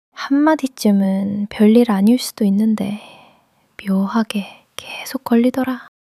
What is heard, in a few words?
sulk long sulk